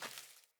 Sandals Run Vegetation, Footsteps; Player

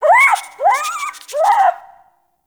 Rabbit Ambient Call; Warframe; Duviri

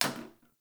Janitor Plastic Spray Bottle; Warframe; Ambience; NineteenNinetyNine

